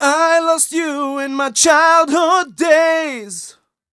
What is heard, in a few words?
m 127 mel a, Vocals